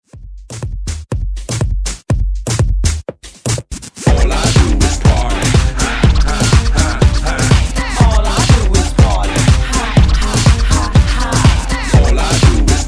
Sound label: scratch, 122 bpm; DJDOLLS